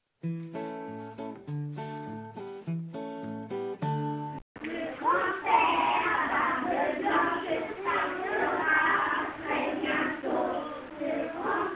chanson grand - pere a barbe blanche par eleves

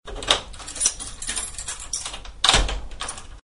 serrure 2 tours

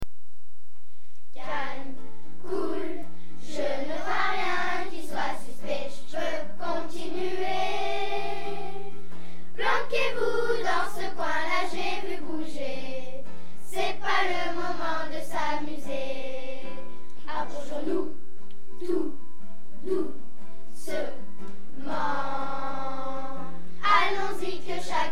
Calme cool

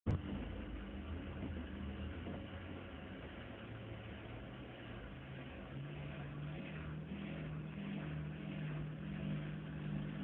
machine a laver

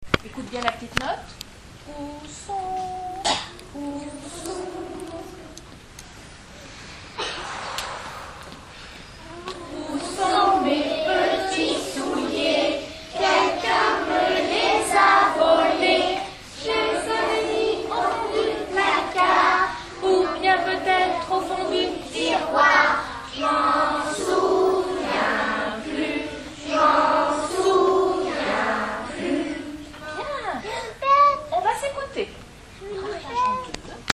Ou sont mes petits souliers chorale

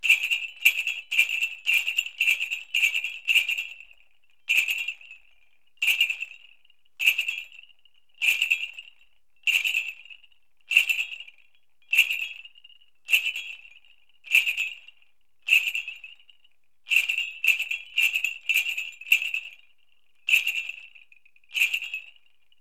sons des grelots

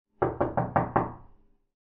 frappe porte

